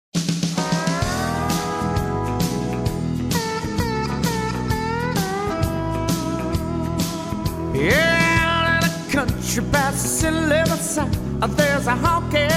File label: One-Man Band